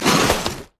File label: basicattack; Ziggs; snowday